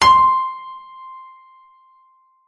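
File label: harp note C7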